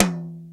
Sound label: TOM; Crypton - DrumBase5000; DRY KITS